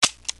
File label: empty shot